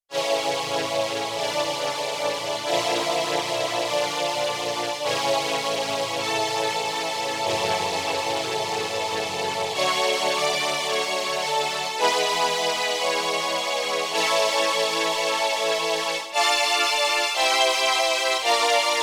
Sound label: Retro Strings, Korg Radias-R